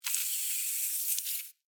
Alien Spider Web, Scifi, Alien, Spider, Web, Shoot, Insect, Science, Fiction